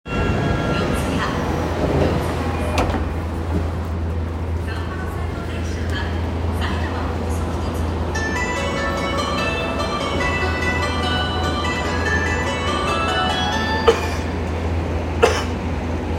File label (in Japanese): 南北線四ツ谷北, train sounds